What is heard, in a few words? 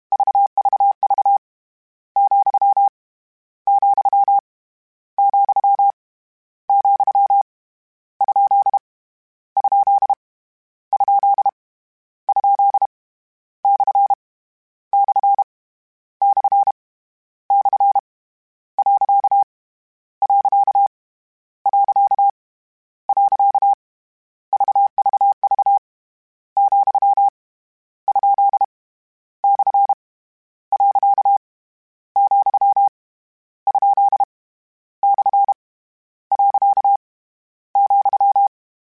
Morse Code, CW Code Groups; Mixed